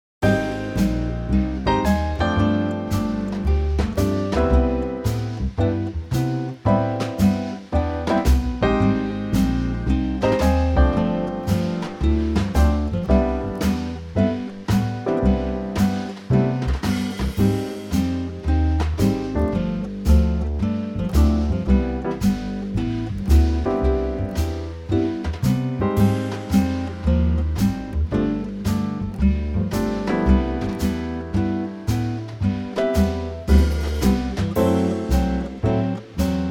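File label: C Quartet, backing track; key - C - vocal range - G to C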